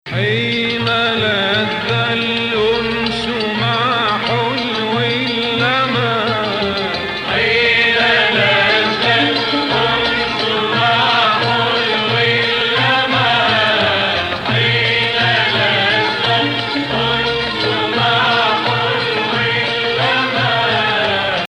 Sikah Jadaka 2nd Rast

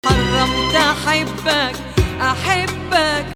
Hijazkar Nakriz

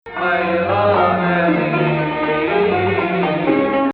Rast Nahawand

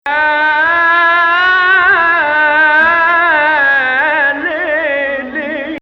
Rast Kull illy habb Rast Octave

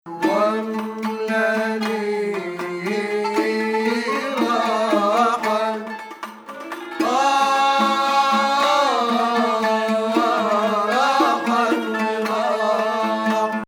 Hijazkar Octave

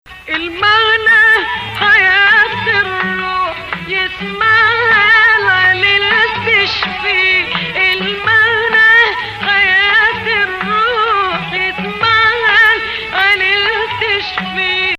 Rast Bayati Saba

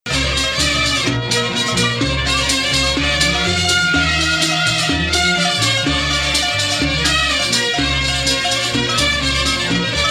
Rast Octave

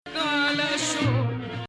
Sikah Beladi

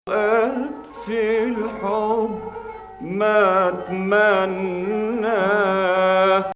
Hijaz Octave; Zanjaran

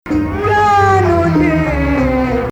Rast Hijaz

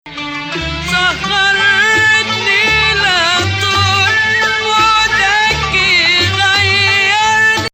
Bayati Rast

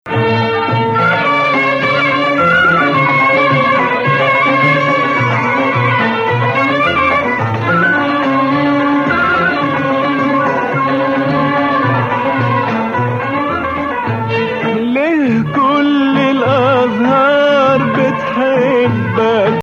Nawa Athar Gameel Saba

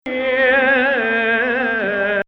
Rast Kull illy habb Nahawand

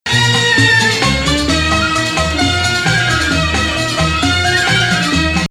Rast Hijaz

